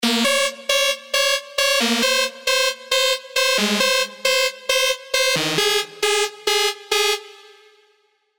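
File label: Lead Saw; Overused; SERUM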